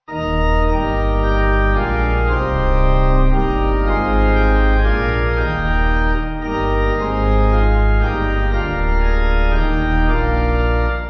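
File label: pipe organ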